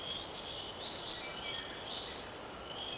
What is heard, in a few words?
forest; Ambient; MX vs ATV Unleashed; XBOX